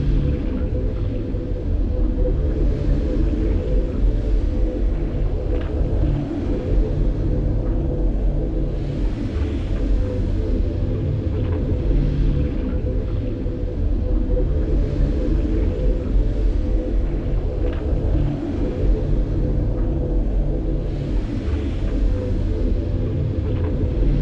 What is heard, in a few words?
amb star hum; planets; Stellaris; toxoids